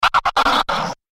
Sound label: Record Dj Scratch Spin; Musical; Blastwave; Sound Effects:Musical